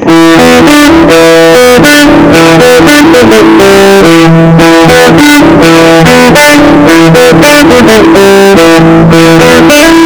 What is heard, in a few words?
JHS Treble, Big Muff Fuzz; JHS Muffuletta; Stoner Rock; guitar riffs